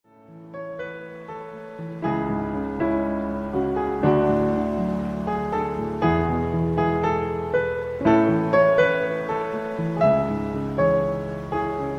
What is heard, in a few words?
piano tone, بيانو هادئة حزينة; sad tune